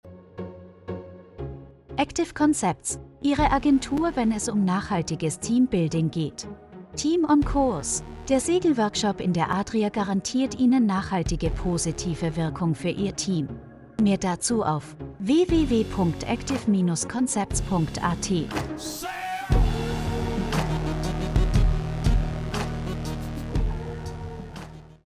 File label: Jingle